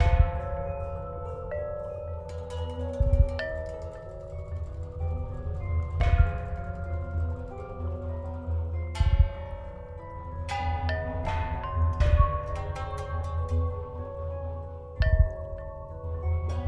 Music (Gameplay State Neutral); Music Hideout4; H4 Level Area=Cave Lvl4

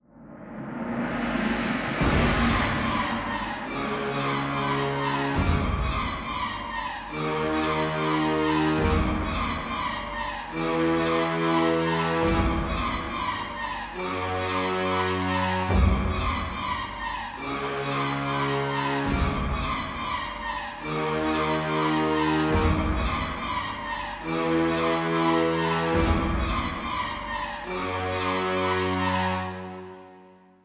Stinger Frantic Long; Pillars of Eternity

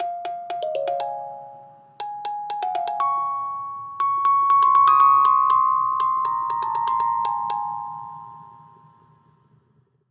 Music Box; Assassin's Creed Syndicate

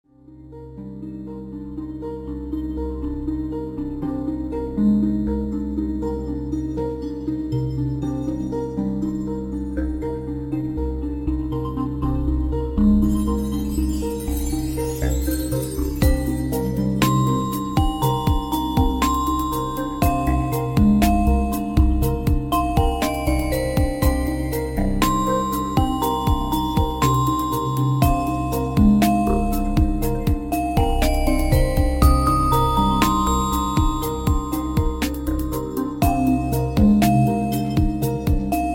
Christmas Special Lobby Music; Kahoot

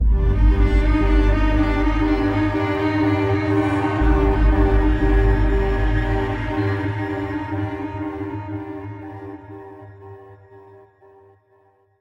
String; Assassin's Creed Unity Dead Kings

